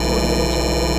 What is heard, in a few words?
scifi machinery loop